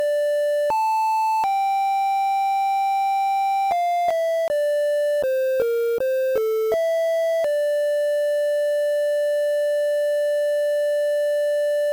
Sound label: triangle wave